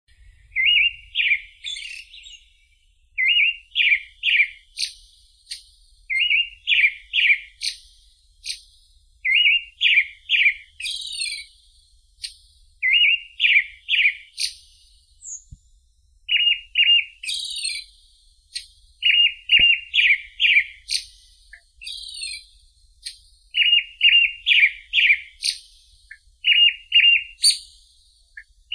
drozd zolotistii turdus chrysolaus

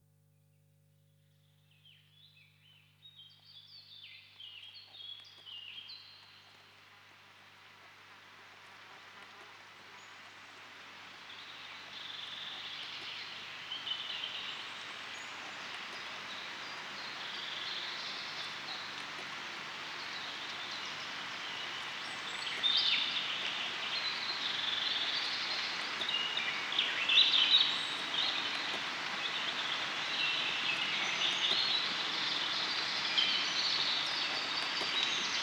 ruchei v lesu laplandskaya ovsyanka, zvuki prirodi

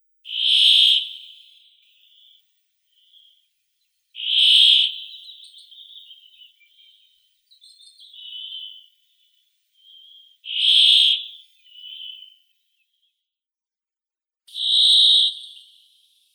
urok fringilla montifringilla

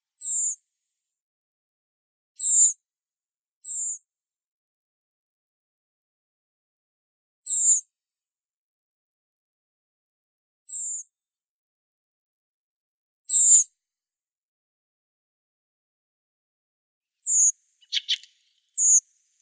drozd belobrovik turdus iliacus

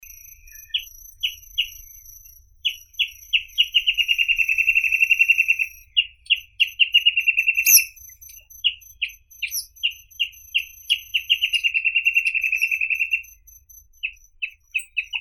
sutora trostnikovaya paradoxornis heudei

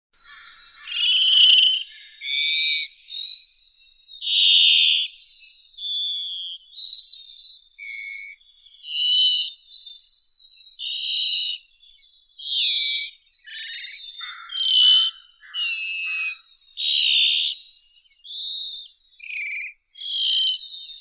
drozd raznogolosii ixoreus naevius

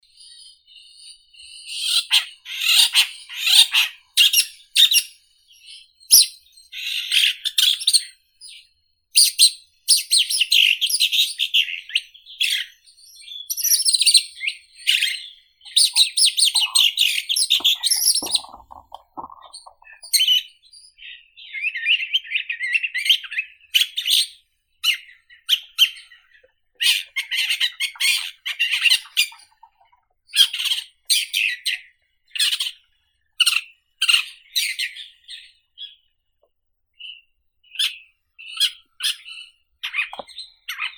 sorokoput dlinnohvostii lanius schach, zvuki prirodi

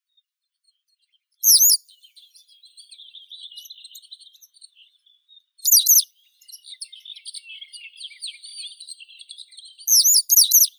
zvuki prirodi tryasoguzka zheltogolovaya motacilla citreola

